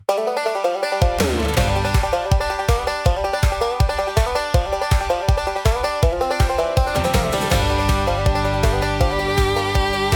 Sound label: Banjo, country acoustic; country acoustic for nature and refreshing